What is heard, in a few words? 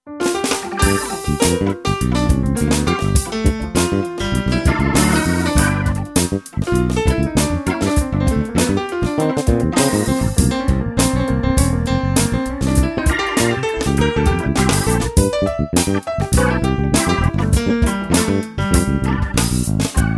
funk